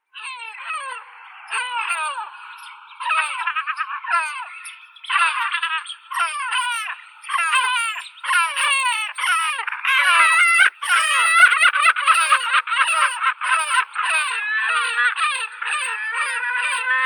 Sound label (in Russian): chaika armyanskaya larus armenicus